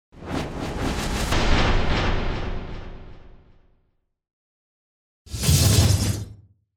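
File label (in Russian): поворотный переходный текстовый логотип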